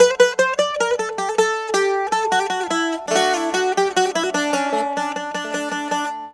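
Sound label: tzouras, D; TSOURA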